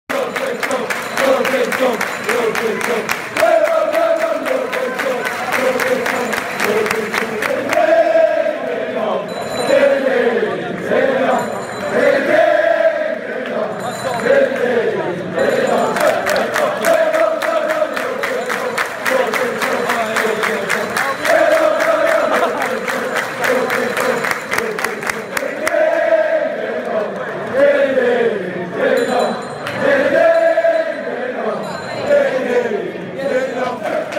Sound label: european tour, fanchants